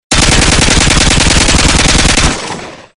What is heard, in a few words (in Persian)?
SCAR صدای اسلحه